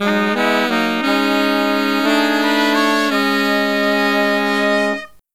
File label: Killer Brass Riffs, MED; USB Soundscan vol.29 - Killer Brass Riffs; AKAI